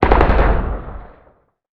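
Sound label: weapon anti-aircraft gun firing distant short; Explosions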